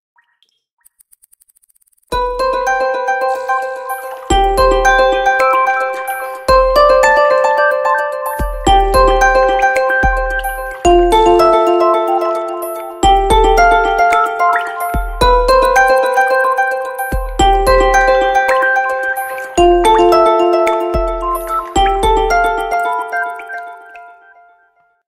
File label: Vivo Original Ringtone